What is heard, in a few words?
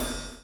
CHAIN; Spectrasonics - Backbeat